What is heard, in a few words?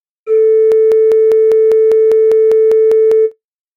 bad loop